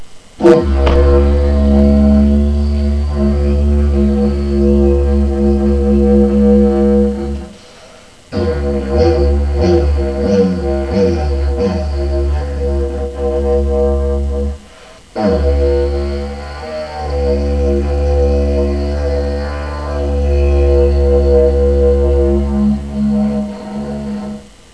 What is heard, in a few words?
real didge